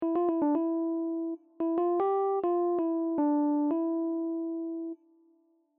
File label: phryg melody